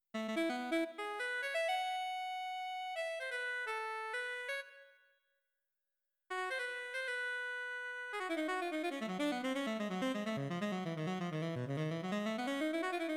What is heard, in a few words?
Alto Solo